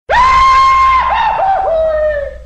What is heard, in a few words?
yaahoohoohoeii